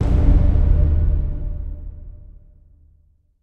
Music sting verb; music stem extracts

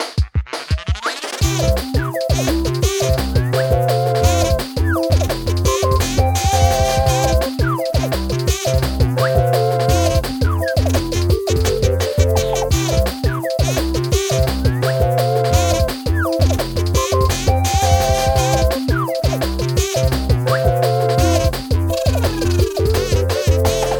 kazoo bus; The Swords of Ditto

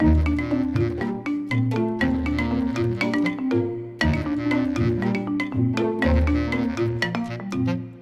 classic xylo; music world

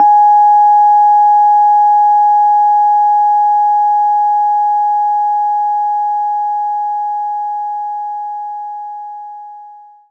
Rhodes Ab4; knights try

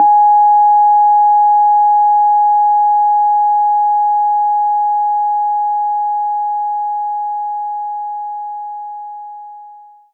Rhodes Ab4

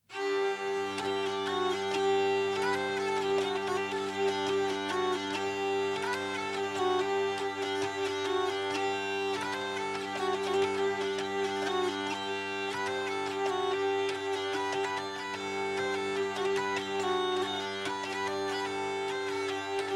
Tavern; Assassin's Creed Unity

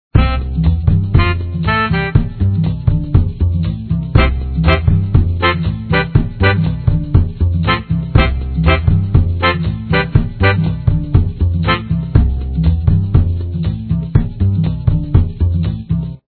Theme Music; Feed Fribbit Colors

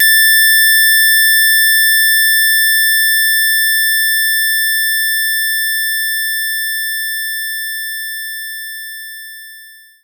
Harpsichord Bb4; knights try

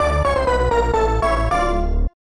Game Over (Variation); Super Loopz; SNES

